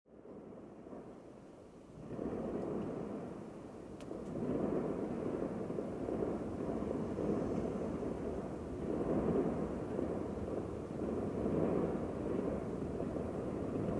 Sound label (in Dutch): Vuurwerk; Aarle-Rixtel